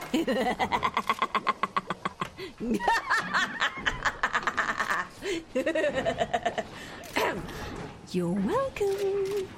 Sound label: fuzzy laugh